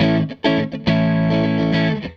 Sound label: Gretsch Guitar Loop